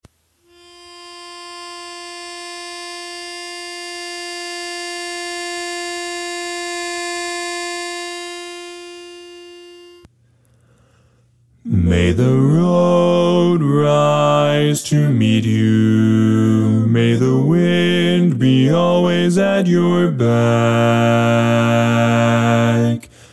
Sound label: irish blessing bass